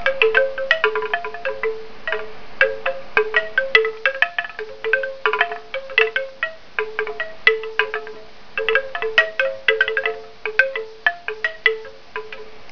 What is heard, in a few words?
Coconut Chime Sound; WindChimes